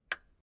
Car Classic Bentley Eight Dashboard Radio Dial Turned Off; Tom Hutchings